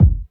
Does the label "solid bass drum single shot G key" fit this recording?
Yes